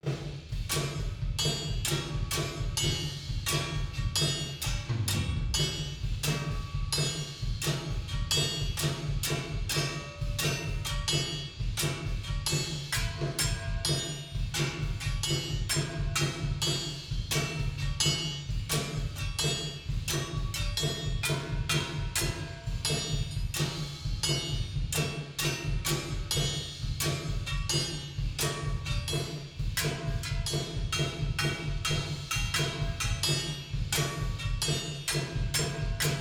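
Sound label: Layer Drums; The Binding of Isaac Repentance; Genesis II Reverse Mines Layer (Drumz)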